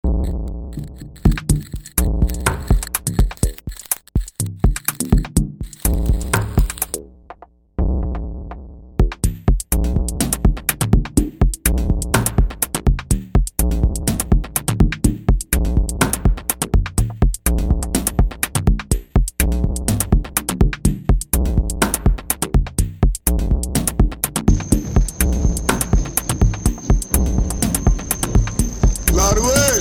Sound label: witch glitch dub